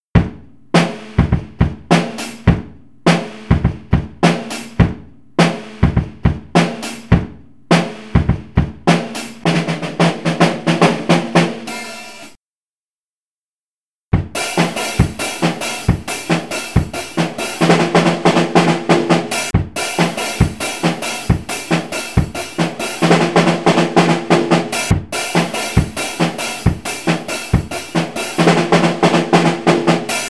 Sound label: Chill Drums